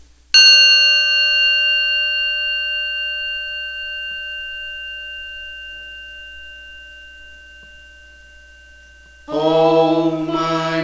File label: mantra padme hum hrih